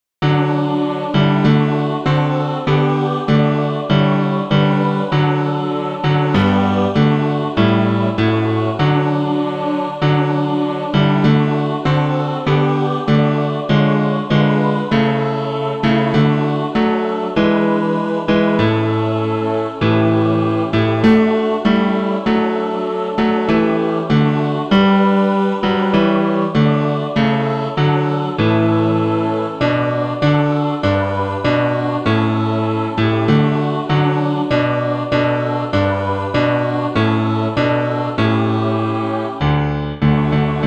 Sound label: mixed